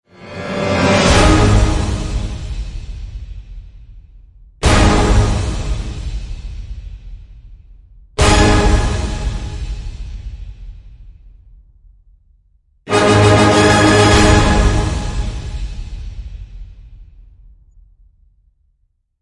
Orchestral End Hits (G#); Epic Tools vol. 1

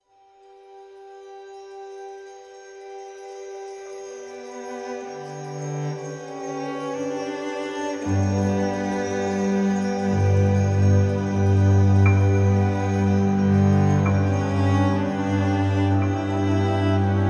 Afterlife Ambient

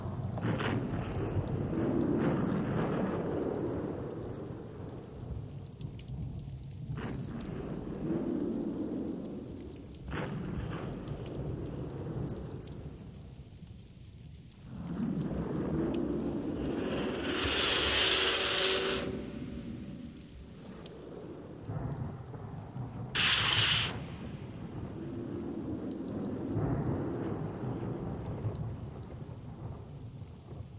Sound Effect Test

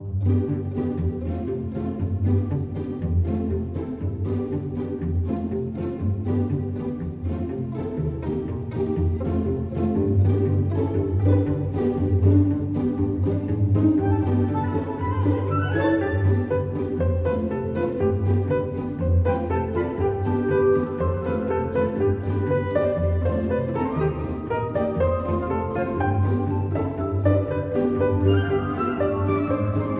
Quirky Cinematic

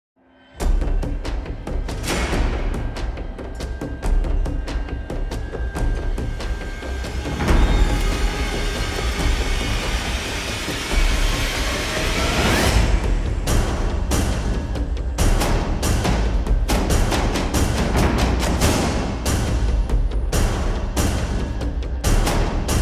Percussion; Fired Earth Music

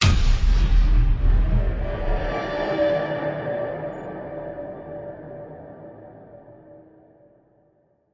Colored Hit Tonal; Ninja Tracks

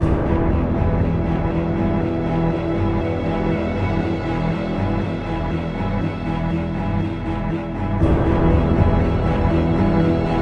Seething Ambient

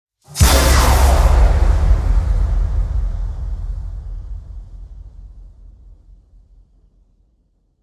Impacts Demolition; Gothic Storm Music; Advanced Sound Design 2012

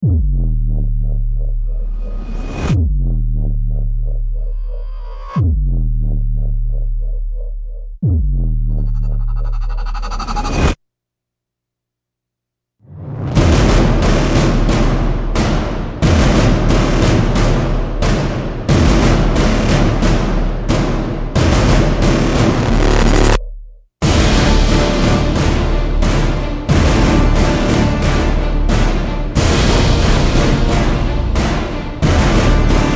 No Choir

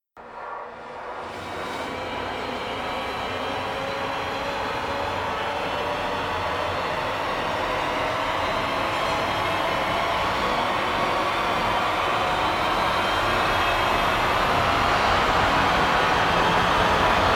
Surface To Air Rise; Ninja Tracks

